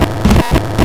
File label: Glitch